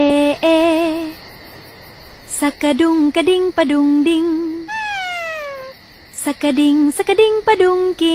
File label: ehh ehh sakadung kading padung ding ahh